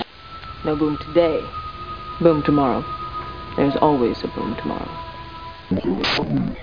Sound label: STATIC; Babylon 5; Ivanova